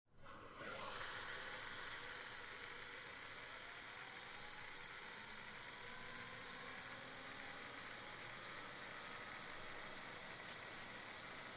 Gnat: Start / Idle / Taxi; Slow Start With Clicking Fuel Ignition Sparks, Builds To Alternating Revs And Idles. Close To Medium Perspective. Jet.